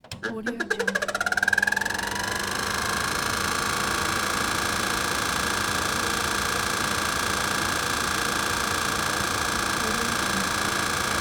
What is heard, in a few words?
broken ventilation fan